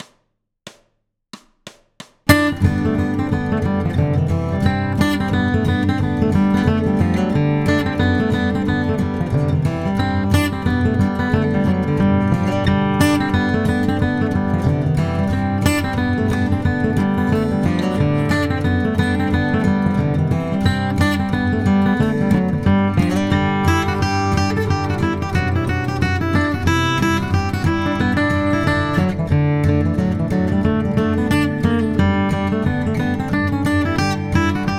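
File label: hoedown, guitar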